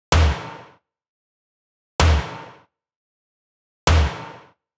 Perc loop, Big EDM Drum Loops 128 BPM; Future House; Future House Tools

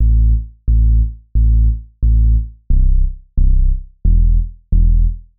Bass B, Hip Hop Exotica

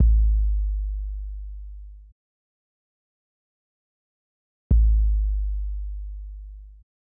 Kick B; 102 Fmin; Hip Hop Exotica; Drum Tracks; Big Fish Audio

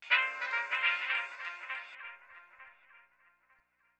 Stab; Thirty-One Flavors

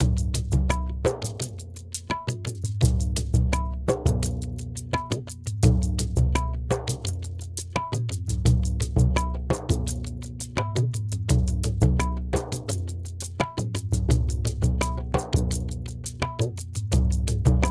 perc mix 085 with duff, Hip Hop India 085bpm; Hip Hop India Modern Percussion

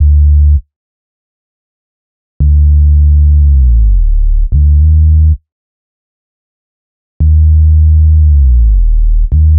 Bass, Hip Hop Exotica, 100 Dmin; Apple Loops; Big Fish Audio

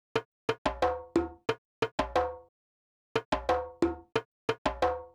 Djembe, 090 Cmin; Hip Hop; Hip Hop Exotica; Apple Loops; Big Fish Audio

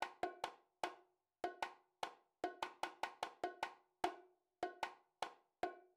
Bongos, 075 Bmin, Hip Hop Exotica; Apple Loops; Big Fish Audio

